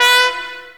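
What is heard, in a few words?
high trumpet; Zero-G - Funky Elements; TRUMPS+BASS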